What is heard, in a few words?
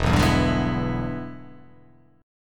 E 7th Sharp 9th, chord